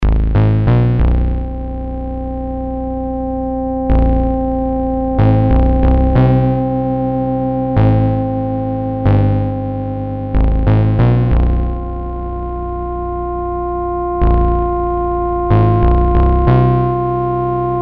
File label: Darkness No Chimes No Drums; Chuck's Zombie Petting Zoo; Marr0w Productions